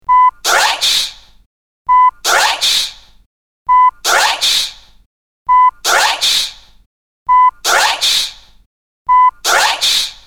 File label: scratch